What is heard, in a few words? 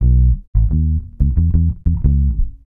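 reggae bass B; Best Service Advanced Media Trax Modern Composer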